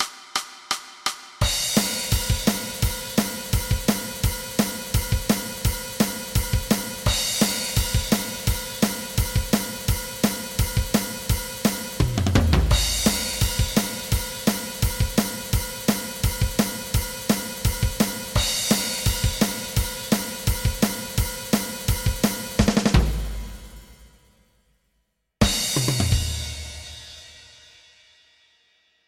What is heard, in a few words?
drum